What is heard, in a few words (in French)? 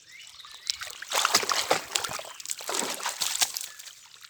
aquatic pateaugeage; Eaux